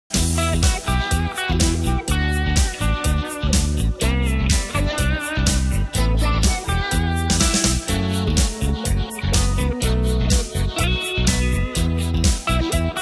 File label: instrumental